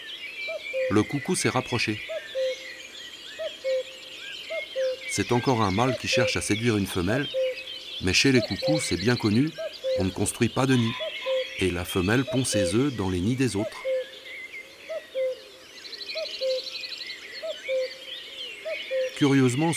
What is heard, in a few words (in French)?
Coucou gris; ABC des chants d oiseaux